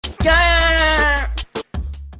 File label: Rap